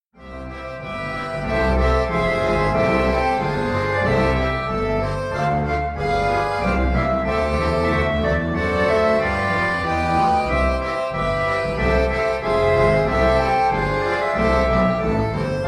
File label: Jingle Bells Trio Computer Sample